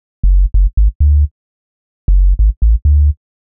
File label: Bass Loop 130 Cm; Bass Loops 130bpm; Downtown House